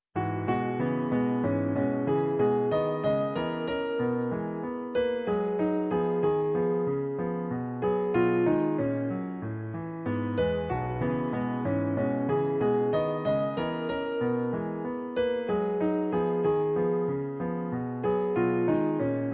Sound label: Piano folk; Andante